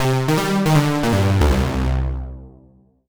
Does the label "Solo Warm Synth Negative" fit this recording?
Yes